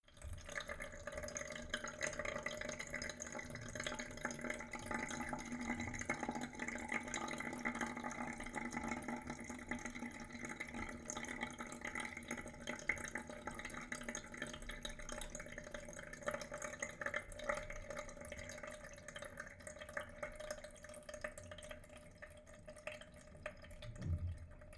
sink fill light long

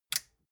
metal button push power off

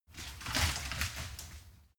wood limb crack drop big

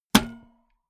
bmx bike grab bar heavy

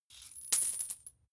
coins drop soft

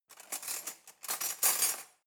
cutlery knife drawer rattle

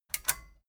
metal button stereo click disable fast

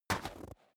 step snow single right

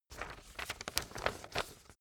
handling paper

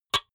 typewriter single

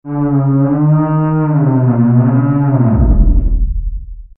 moaning elements

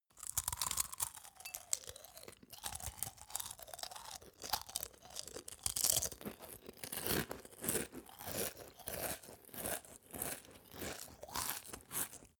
male open eat cereals

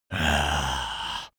zombie moan idle throat

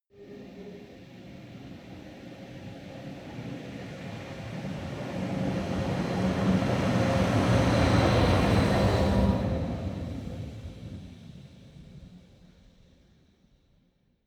train locomotive drive by

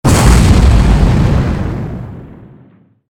war zone big explosion

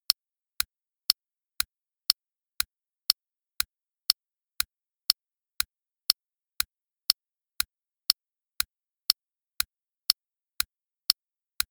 clock tick

